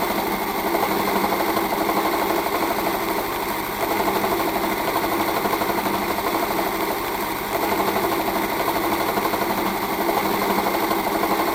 Vibration, Case, Objects, Rattle, Clatter, Rapid, Violent, Loop